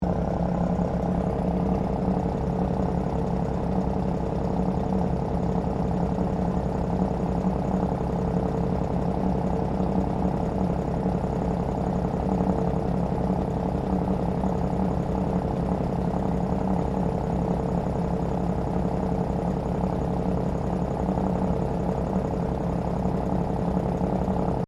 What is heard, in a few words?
Train Diesel TGR X Class 1951 Tasmanian Idle Frontend Loop; Bellarine Tourist railway vehicles; Trains